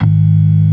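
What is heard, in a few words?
ORG. G#1; Best Service; Advanced Media Trax Modern Composer; B3 FULL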